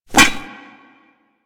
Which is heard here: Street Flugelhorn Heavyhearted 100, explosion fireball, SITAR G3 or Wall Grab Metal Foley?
Wall Grab Metal Foley